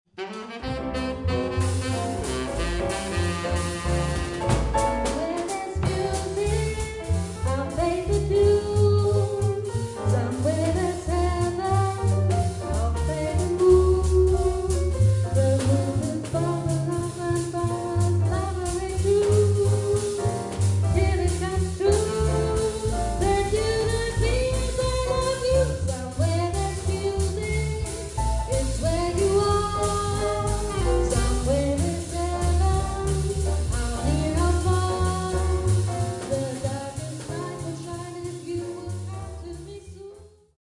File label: Swing